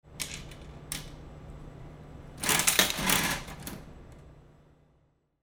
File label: medicine vending machine